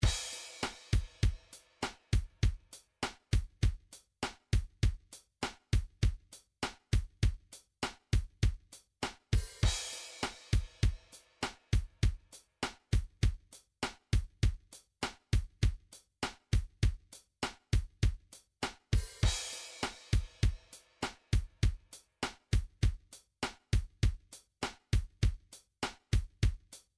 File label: drums pop rock, midi drums